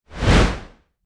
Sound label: whoosh smooth